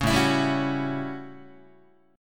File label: A 13th A down Guitar; strummed